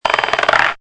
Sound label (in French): FLECHE; MOBICLIC174